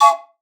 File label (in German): ZUG PFEI; Best Service ProSamples vol.42